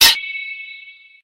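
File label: trans sword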